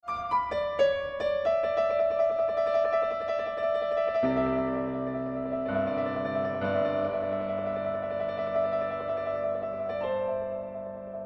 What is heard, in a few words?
Beethoven Piano Sonata No. 32 in C minor Op. 111 2. Arietta; Free Style; A. Brendel 1970